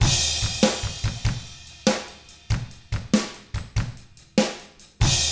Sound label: ROCK 6/8, Drums; ILIO; Double Platinum Drums 1